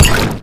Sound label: imp bullet lightballistic bubbleshield; Titanfall 2